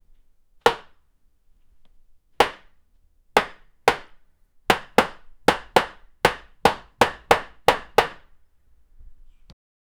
boxing

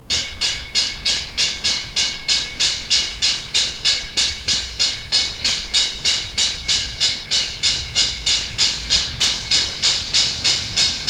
steam locomotive chugging

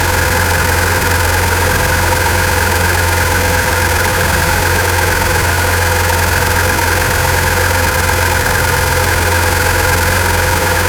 v8 engine sound idling, 800 rpm

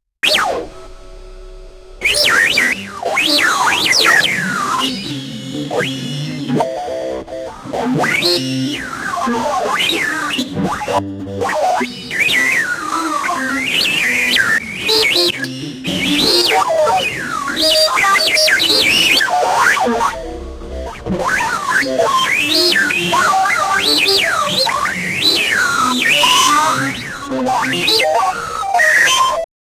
cosmic pings bops noises

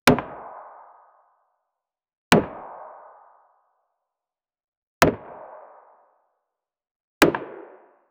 shoot arrow wooden sound; mmorpg

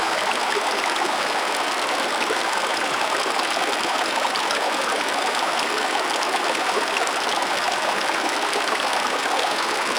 a river in a forest; creek

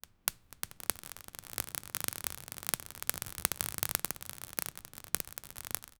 gentle flame crackle soft, fire

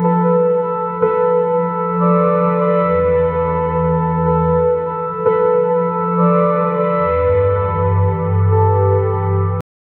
style titl, game music

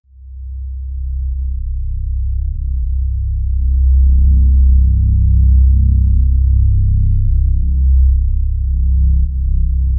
deep bass rumble apocalyp; apocalyptic

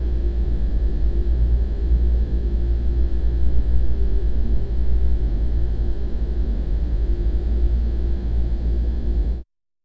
sparse distant wall and c, creepy

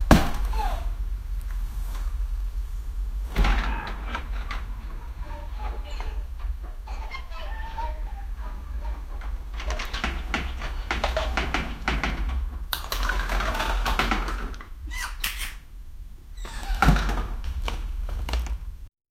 outdoor sounds indoor

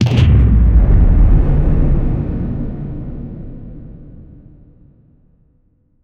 cinematic fx downer sound

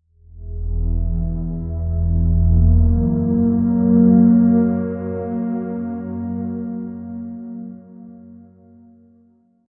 dark mysterious and atmos; low strings

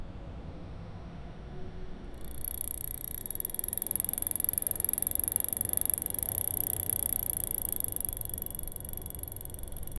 diamond revolving 360

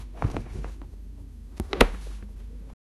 library discovery fingerti

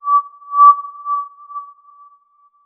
ui confirmation beep soft

